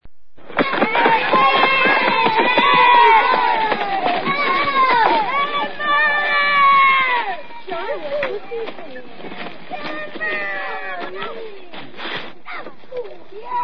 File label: CHILD